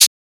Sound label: Shaker